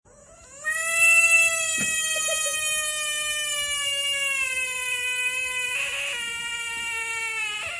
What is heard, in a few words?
very annoying sound